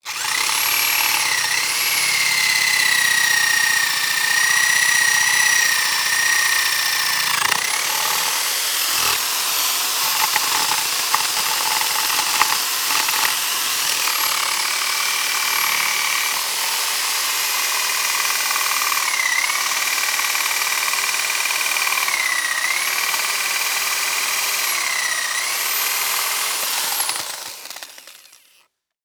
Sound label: Industry Tool Drill Power Drill Drilled Into Wood